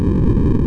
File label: JET; Sonic R; Windows